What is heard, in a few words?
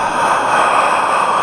breath